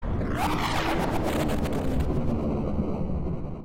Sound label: Laugh; Lucavi